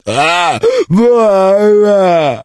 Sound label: zombie take damage